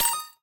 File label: coin Collect